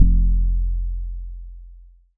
Bass, 2600 F1, Synths, ARP 2600

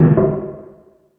FX Sounds, Drum Machines

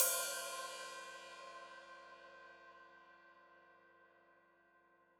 Hat Open, Hi-Hat

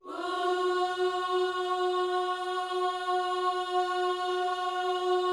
OOS F#4, GOSPEL; Spectrasonics; Vocal Planet

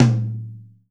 TOM L, DRUMKIT

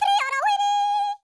YODEL, World Voices; ILIO; Vocal Planet VOL-5; BAVA. YODEL

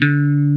ELECT. BASSES; OMI - Universe of Sounds

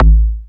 bassdrums, techno; single-sounds; single-drums

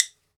WOOD BONE, Celtic Percussion; E-MU Producer Series Vol. 7 – Old World Instruments

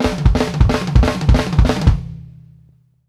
MOREDRUMS; Sampleheads; New York City Drumworks VOL-1; KD FILLS 120

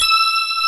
LINEAR SYNTH

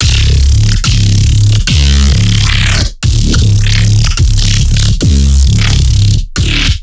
neuro, bass

